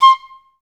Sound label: STAC C5; Best Service; Peter Siedlaczek's Advanced Orchestra